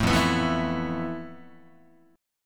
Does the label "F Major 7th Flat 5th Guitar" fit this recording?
Yes